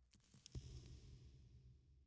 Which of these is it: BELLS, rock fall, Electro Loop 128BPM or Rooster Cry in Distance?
rock fall